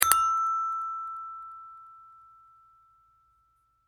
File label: correct; 鉄筋を使ったピンポン×2回・かすれ音ver; 正解の音・ピンポーン